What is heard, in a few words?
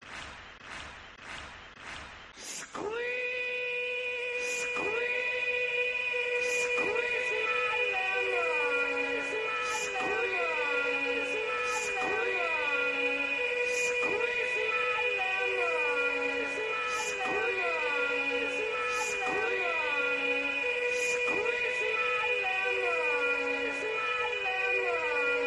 2 claps